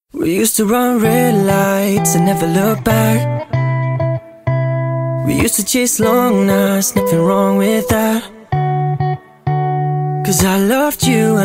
chill out music mix ringtone; english ringtones

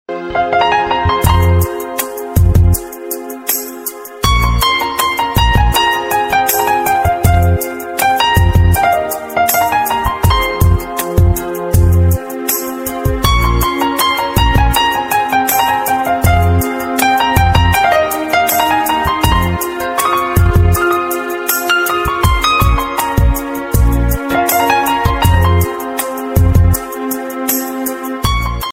sad tune ringtone

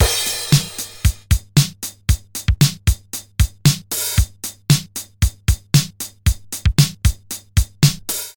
drums ringtone

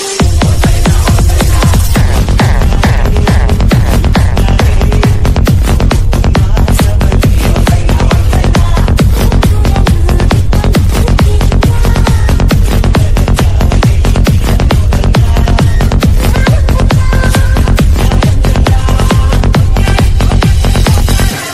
dj mix; malayalam

